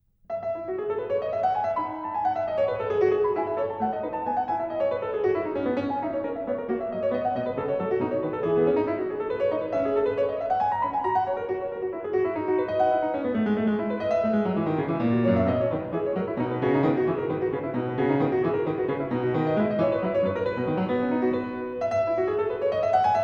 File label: Courante, KLAVIER; French Suite No 6 In E Major; BACH